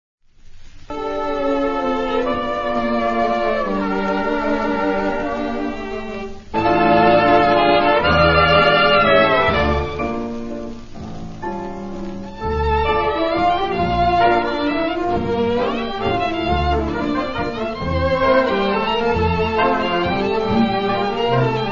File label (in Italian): ORCHESTRA